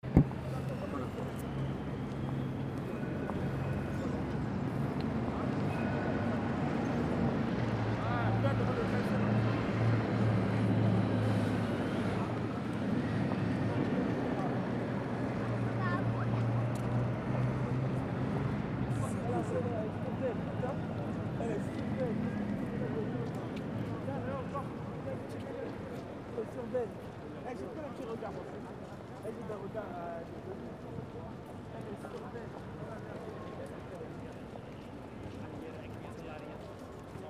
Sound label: St Denis Market Place at 8pm